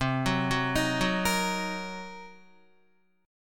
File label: C Minor 7th Flat 5th Guitar, chord; Cm7b5 arpeggiated; JGuitar